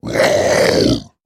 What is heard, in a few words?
Monster Growl